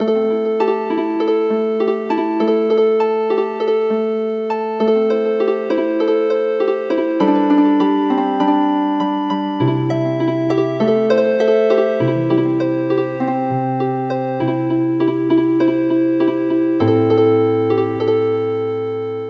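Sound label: Healing Harp, traditional; The Rainbow Sister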